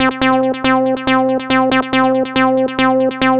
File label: NRG Arp, trance